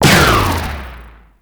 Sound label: Sickle Heavy Combo; Warframe; Lasrian Soldiers; Weapons; Lasrian Butcher Sickle